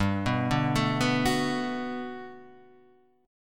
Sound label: B Minor 7th G, chord; Bm7/G; arpeggiated; JGuitar